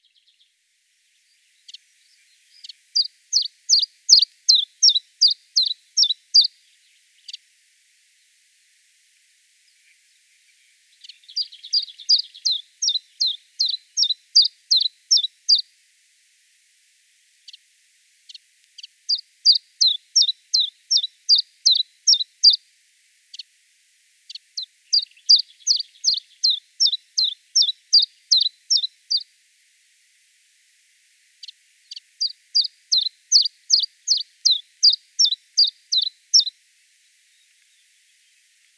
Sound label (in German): Zilpzalp Gesang; Wiesloch